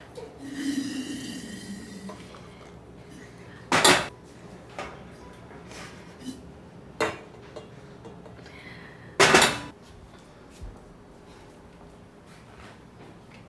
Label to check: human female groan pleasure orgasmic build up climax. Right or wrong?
Wrong